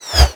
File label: grenade whizzby